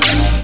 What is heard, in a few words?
shield up; xshipwars